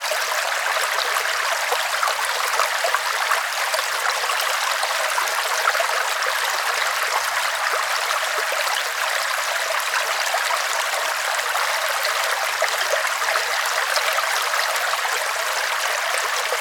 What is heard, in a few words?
water stream; sound effects one